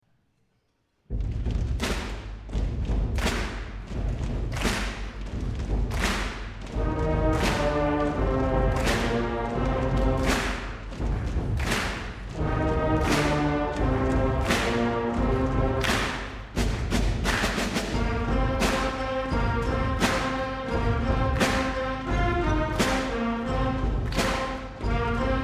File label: Power Rock